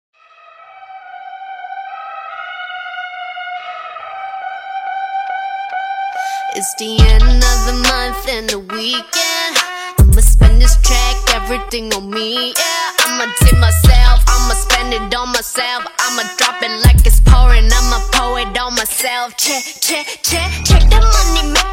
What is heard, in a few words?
MUSIC SOLO K POP